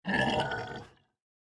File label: Punchpig Grito